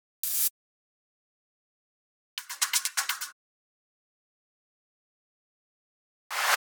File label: 126BPM Tech Freak FX; Vengeance Samples; Tech Freak Kit